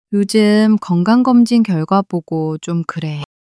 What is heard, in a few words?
struggle